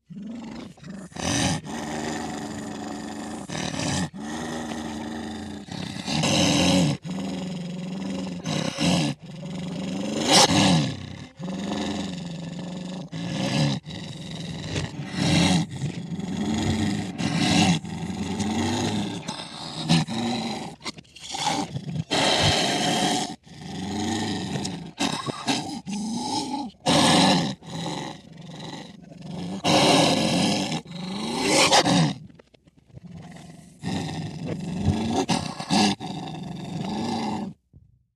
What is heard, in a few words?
Vicious Animal, animals wild wolves